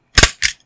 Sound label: Stapler Press